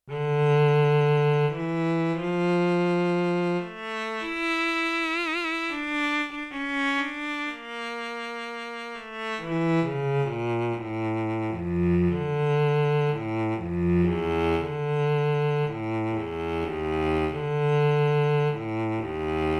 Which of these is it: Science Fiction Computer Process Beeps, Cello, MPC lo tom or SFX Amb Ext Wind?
Cello